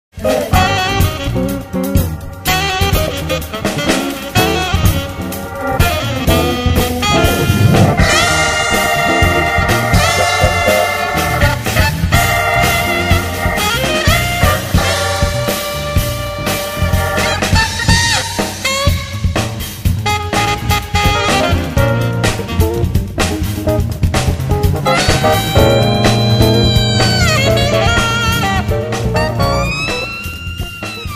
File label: FUNK